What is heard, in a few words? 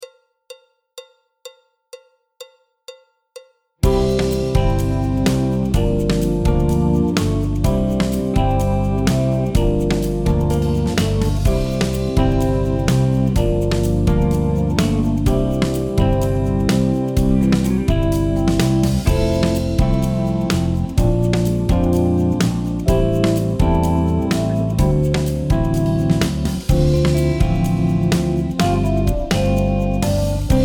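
backing track, Jazz; clarinet, flute, tenor; Jazz Syllabus Level 1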